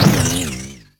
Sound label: dryfire; buster